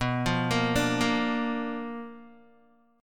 B Minor Major 7th Flat 5th Guitar; BmM7b5 arpeggiated

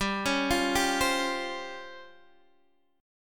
C sharp Major 7th Flat 5th G

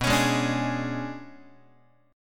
B Minor 6th Add 9th B, Guitar; Bm6add9 strummed; JGuitar

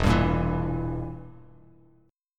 E Diminished E, Bass Standard

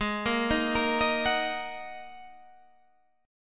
Ab 7th Suspended 2nd down Guitar Standard; Ab7sus2; arpeggiated; chordshape; JGuitar

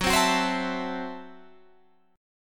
F sharp Minor 7th Flat 5th F sharp

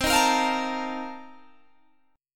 C Minor 13th C Guitar Open G

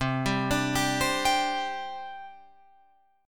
C Suspended 2nd, C, down, Guitar, Open F; Csus2 arpeggiated

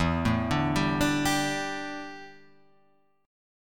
D Suspended 2nd Suspended 4th E

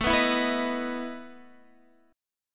E Major 7th Suspended 4th A

